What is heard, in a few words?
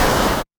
retro game explosion